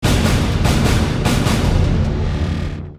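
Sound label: Music Trombone; Mx Danik Trombone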